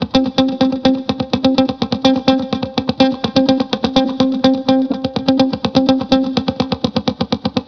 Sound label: Pick Up, disco house